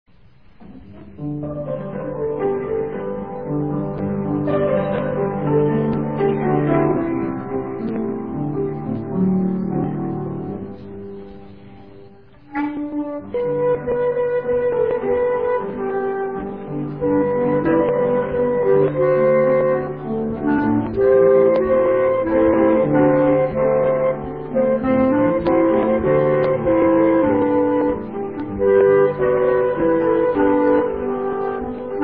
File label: special Music instrumental; Ps23